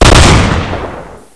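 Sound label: double barrel, shotgun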